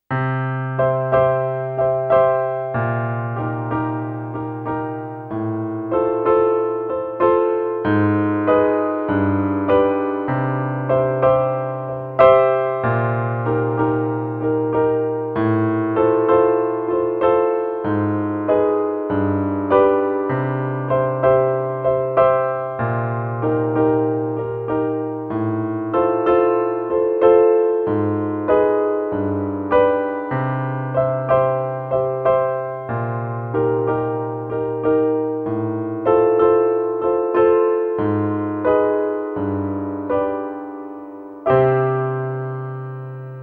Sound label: solo piano